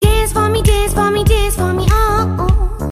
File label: alert music